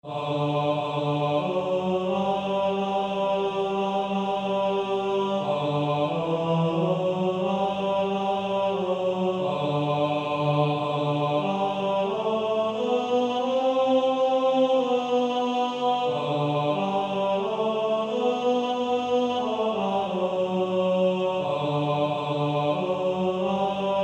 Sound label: Male Choir